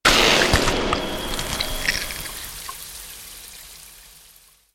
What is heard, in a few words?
Open Wounds; Dota 2; Lifestealer